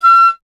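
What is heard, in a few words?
flute piccolo; Miroslav Vitous - Solo Instruments VOL-2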